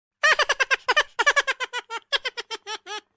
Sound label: long chipmunk laugh